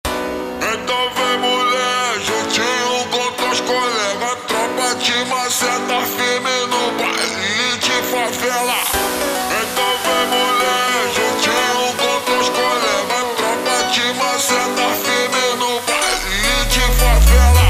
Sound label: slowed, funk